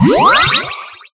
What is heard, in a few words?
Absorb; life of a naomi; Naomi Carrigan